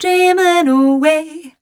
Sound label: f mel e flat, fem Vocals